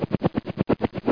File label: copter